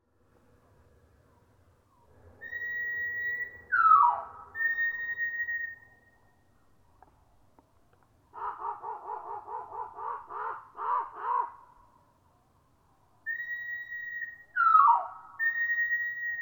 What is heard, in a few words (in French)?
chant alterne; Chant/alarme alterné moins 2